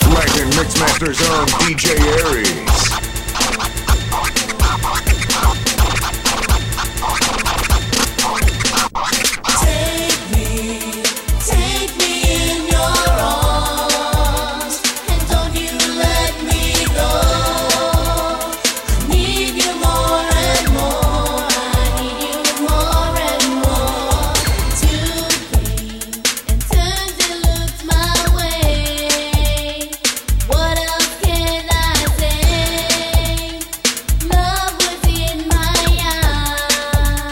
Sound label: 80's Free Style Mix